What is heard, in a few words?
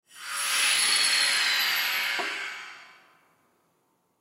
Semi Truck Air Compressor Fill Line; Semi Truck 1997 Mac 427 Air Compressor Fill Line; Industry; Trucks